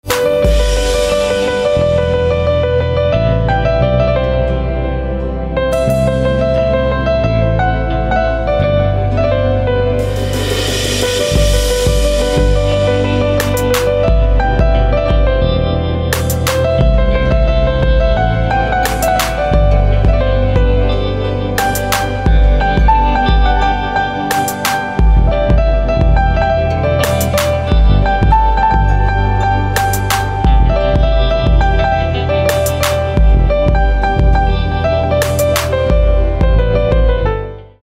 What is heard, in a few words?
Piano